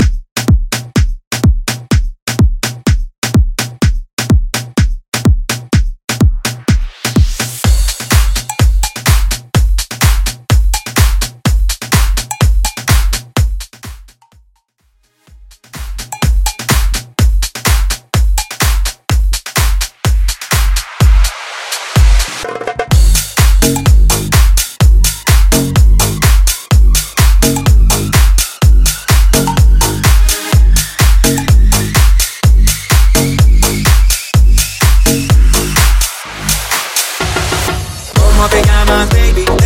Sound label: House RMX Club Mix 125 Bpm